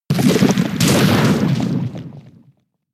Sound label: mud bomb